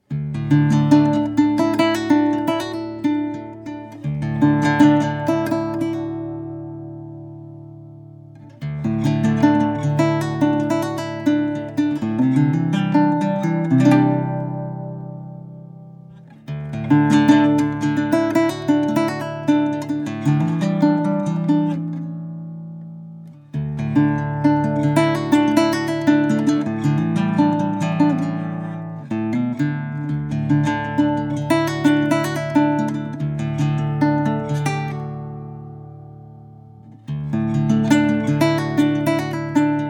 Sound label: Guitar